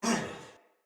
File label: Pain, Barks Battle Soldier; Sinecure Soldier